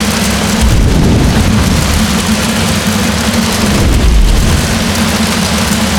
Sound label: Fire Loop; Nursery; ambience ninjago